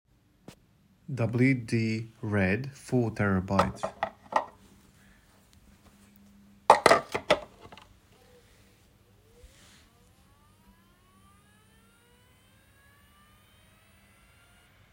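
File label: wd red 3tb, hdd noise; wd red 4tb; What are the Quietest hard drives (HDD)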